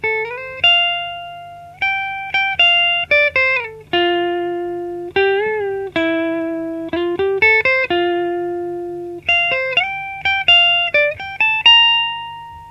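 Strat clean bridge, Bridge pickup, Канал clean Fender Frontman в линию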